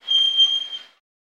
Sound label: Car Brake Squeak